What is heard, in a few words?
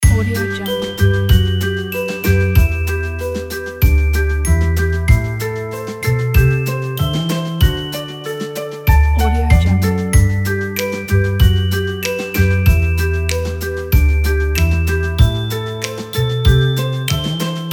children lullaby